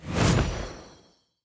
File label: heroes call Of Triumph out; lumenir